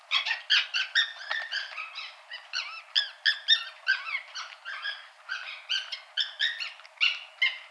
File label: Cuon alpinus solicitation